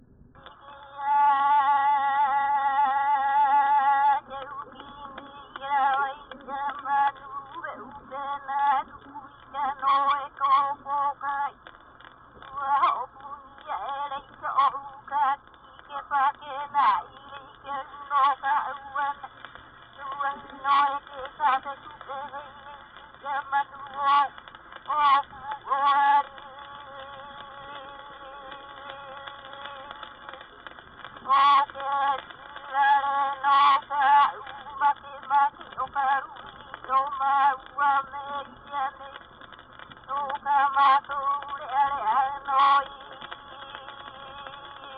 Name chant for chiefs; World Music; Hawaiian; Na Leo Hawai'i Kahiko - Voices of Old Hawai'i

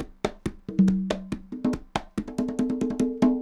congas 140 bpm, latin; Sound Effect Library

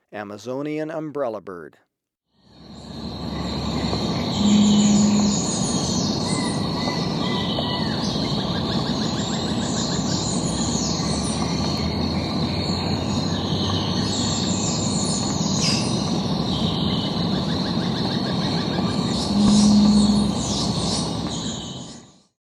Amazonian Umbrellabird, Birds of Ecuador; S. America

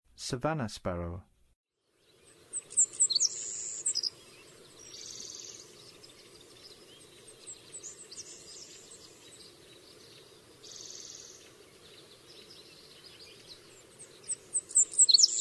Savannah Sparrow; North America

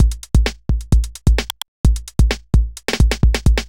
fast break 130

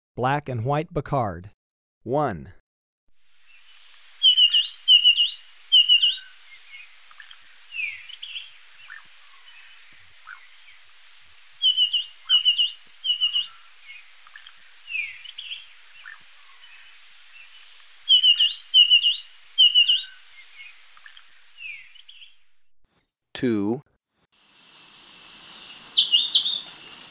Black-And-White Becard, Birds of Ecuador; S. America

